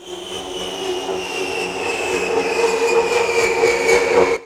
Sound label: weird; Best Service - XXL Production Effects